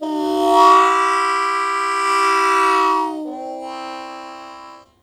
Blues Chord Attack C1, Harmonica; Zero G - Harmonica

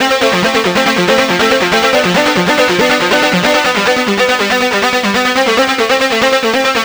NRG Arp, trance

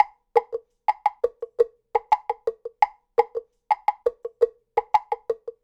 Temple Block, Latin Percussion; Sonic Foundry (Sony Creative Software); Temple Blocks

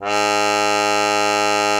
cup mute G#2; Xsample VOL-3

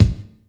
SP1200 KIC, Kix Snares; E-MU Formula 4000 Series Vol. 1; Hip Hop Nation

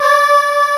AKAI VOX, SYNTH VOX; East West - Global Sounds

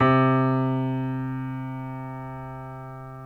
PNO Grand Pno; Club-50 - Foundations Roland

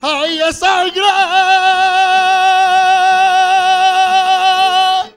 FLAMENCO VOX; Best Service; World Colours

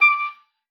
OBOE D6; Xsample VOL-6

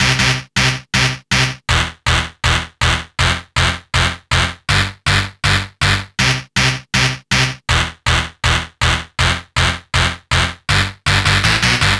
Dance Synths, Hardcore Loops, Synth Loops

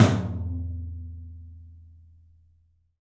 OPEN TOM; 3D Sound; 3D Drums

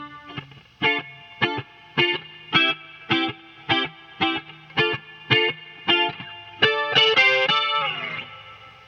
Rhythm Guitar, African; Sonic Foundry (Sony Creative Software); Continental Drift Aftershocks

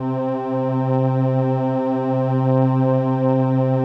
LAMBDA, String Machines

